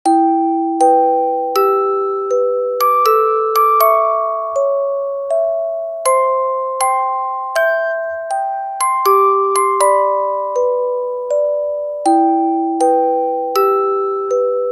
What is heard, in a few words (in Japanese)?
omekashi orgel